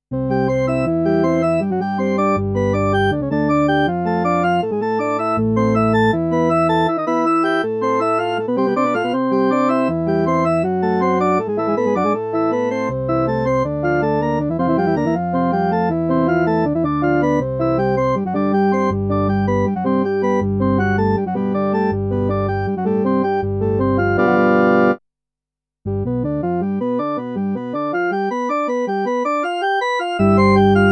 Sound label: Temperament IV C Major Praeambulum; BWV 924